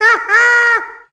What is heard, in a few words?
"ha ha"; Voice; ooh ahh